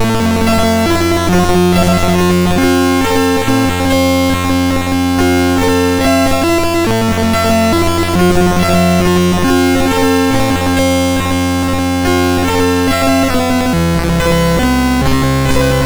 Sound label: Randomly generated music BASIC, sid MOS6581R2